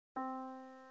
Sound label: Yamaha Disk, piano sound